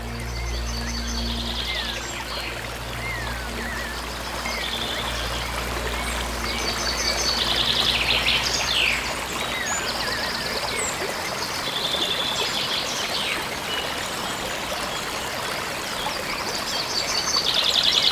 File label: Nature Sounds Woodland Stream